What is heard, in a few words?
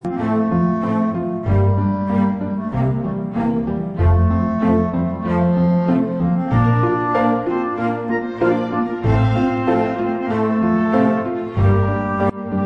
classic, music only